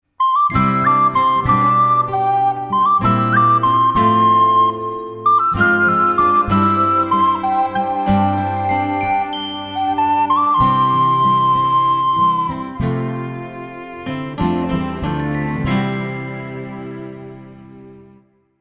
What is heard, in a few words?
jingle